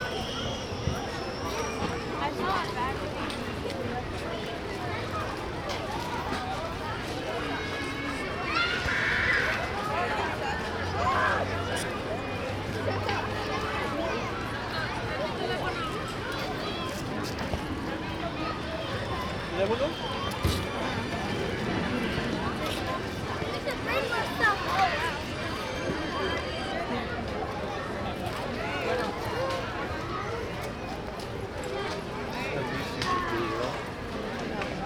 Downtown Houston Discovery Green Ambient

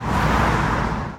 Car Drive By Riser; Navigation; Houston

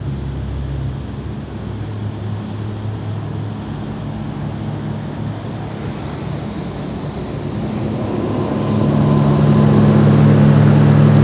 Allens Landing Boat Passing By and Metro Rail; First Ward; Houston

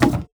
Moody Park Playground Perc; Houston

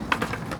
Skate Park Skateboard, percussion; Jamail Skate Park; Houston

